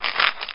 pills

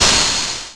AIRSOUND

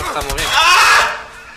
aaah

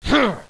jab

